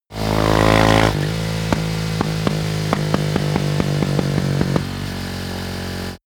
motorcycle motorcross decelerate short acceleration